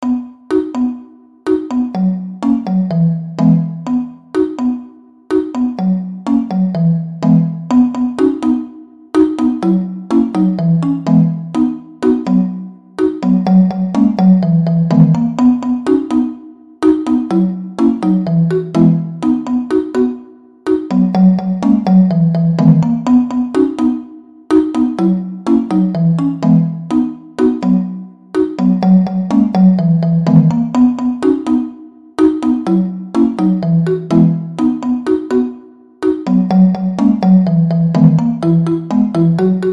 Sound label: xylophone; microsoft